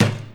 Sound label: Street Ball Wood Back; SFX Kit; Street Basketball